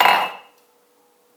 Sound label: Knife And Mug